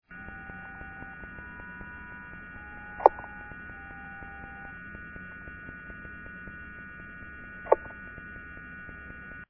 fft tonfolgen beispiel

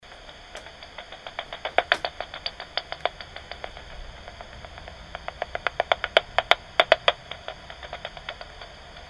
Fledermaus; franzis fledermausdetektor

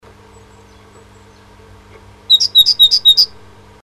w antwortet m; nistkasten

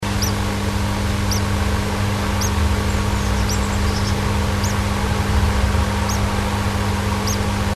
kueken; nistkasten

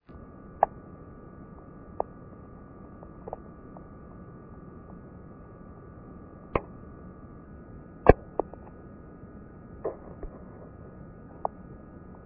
Feld Hinten ATM VLF slow, Natural Radio

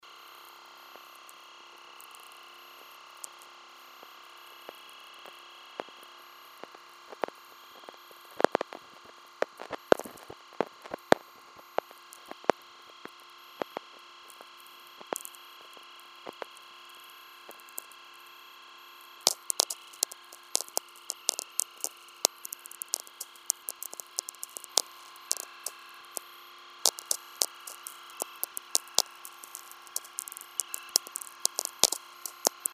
Rodelhuegel Oben VLF

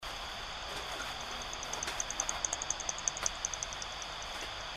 Fledermaus; franzis fledermausdetektor

